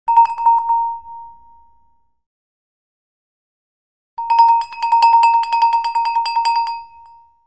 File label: Cow Bells 2 Head Shake, bells